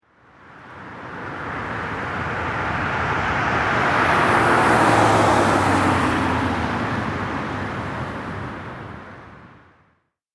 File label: Highway car passing by; vehicle